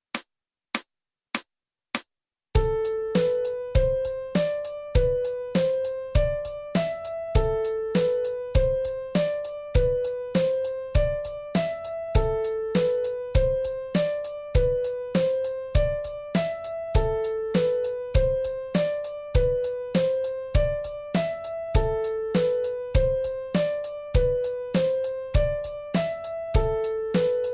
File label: Piano